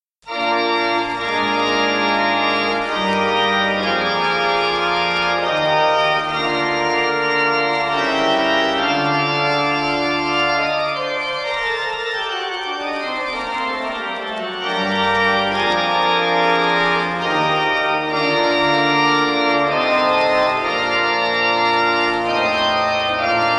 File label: CHORALE PRELUDE; IN DULCI JUBILO